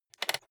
rotary control short turn quick soft

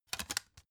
cd in cover soft

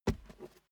step barefoot metal single trample right

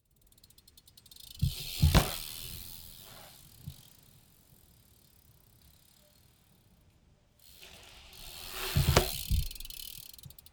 bmx maneuver ramp in and out

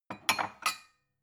glass shelve soft

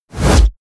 push

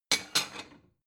plate small shelve slide

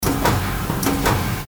carton packing machine

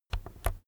keyboard external key press slow soft

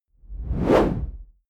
whoosh noise sweep hit low medium

